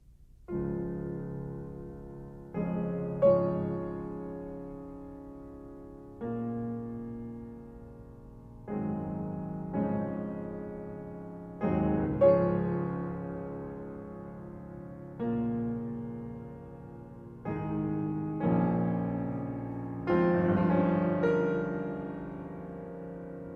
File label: Prelude in D flat major, piano; 24 Preludes